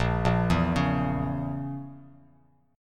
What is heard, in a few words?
A sharp Diminished Bass Standard, chord; arpeggiated